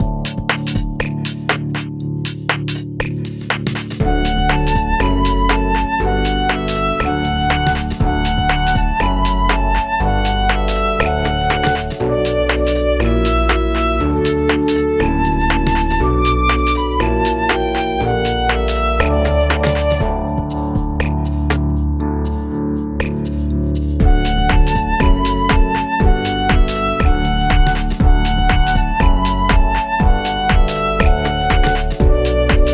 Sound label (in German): instrumental